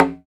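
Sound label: PER PROCU, Procussion, percussion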